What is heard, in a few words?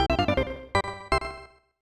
Lose Life; Doraemon; SNES